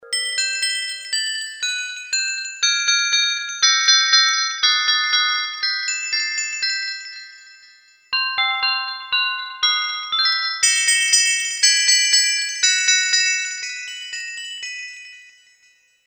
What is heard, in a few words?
korg m1r synth